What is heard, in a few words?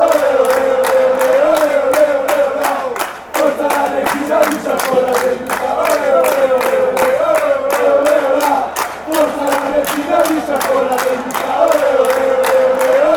ole ole ole, fanchants